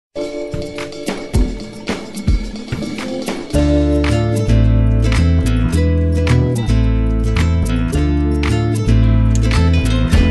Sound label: commercial song; dos equis